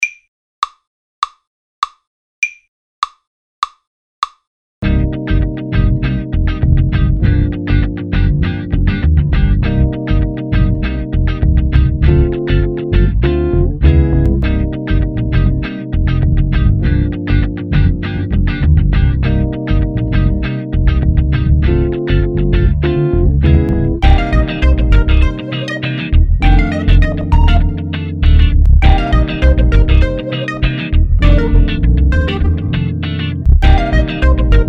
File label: Funk Grooves